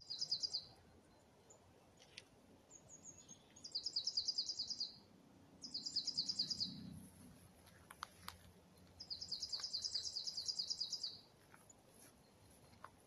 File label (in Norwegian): hagesanger